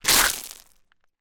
foley foot kick scrape gravel loose small stones single